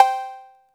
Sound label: 808 COWBELL; AKAI S-Series CD-ROM Sound Library VOL-5